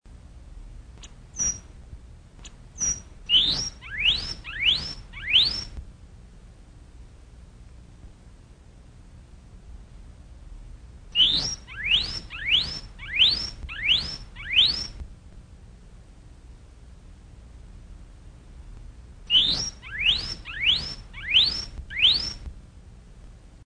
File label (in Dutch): Gelebek Swaay Swaay 6 Stoot